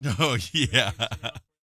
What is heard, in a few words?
LAUGHS, Jazz Fx, Vocal